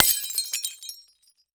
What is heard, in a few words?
Crash Beer Bottle On Cinder Block Smash; crashes; AdobeCC